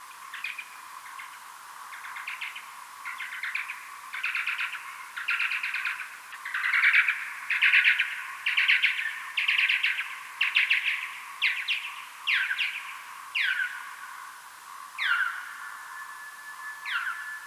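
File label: Eptesicus serotinus, bat; Boehm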